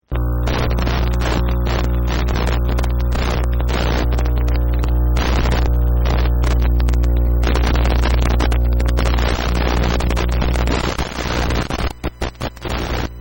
Electronic signal, Radio Static, Electric Signal, Crackling, Scifi; Tech; Electronic Signal 01; Tech Sound Effects